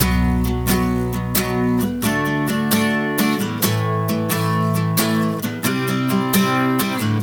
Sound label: Music Rock; Learn to Fly 3